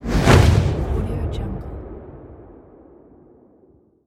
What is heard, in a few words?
telekinetic push